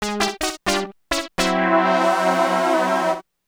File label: (Jingle) Sigh